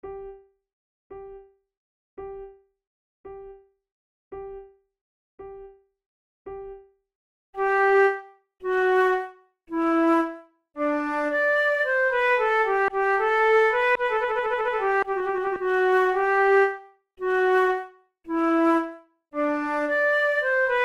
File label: for two flutes rondeau; Monteclair; 6 concerts; flute tunes